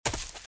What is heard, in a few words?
Footsteps - Leaves - Run - Right; RadwinIsland